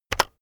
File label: keyboard external enter press very fast heavy